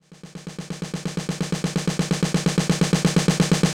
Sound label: Techno Snare Roll; Dance DJ; Drums; Pro Tools Essential Loops; Digidesign